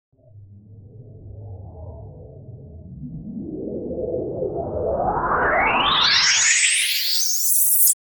a time travel portal opening with a distorted sound; distortion